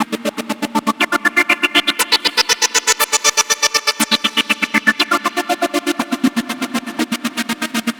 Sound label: Progressive Synth, Trance